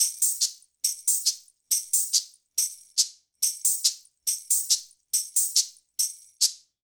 140 Mixed Percussion

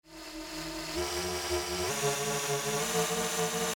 FX Riser; Eternal

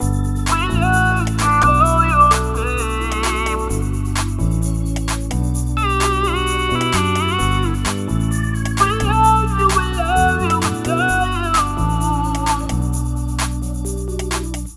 Gospel D 130bpm Full Mix

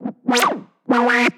Lazer

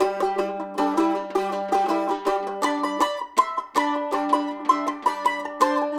80 G Resonator Mandolin

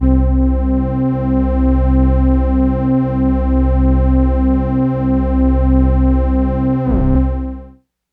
synth one shot large pad C maj

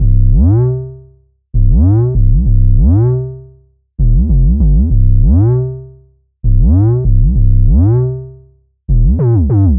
bass 808 98bpm; rubba

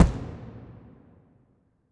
Box Floor Kick

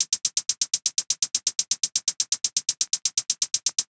123 cymbals loop hihats closed

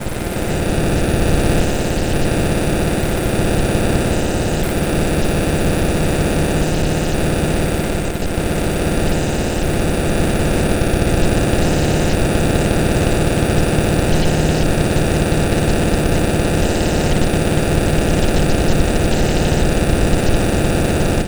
static plugs fx

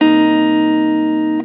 guitar chord Abmaj

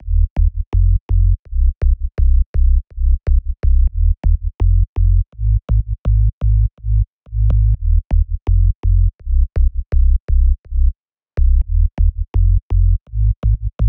Sub Bass D 124 Dry

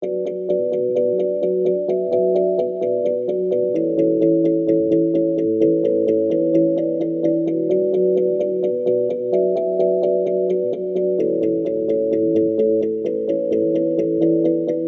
Inspired Melody Loop B min 129BPM

